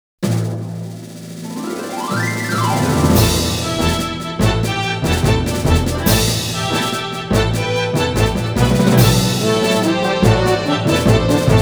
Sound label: Fanfare; Sonic Adventure Original Sound track; Dr. EGGMAN